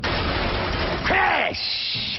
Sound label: crash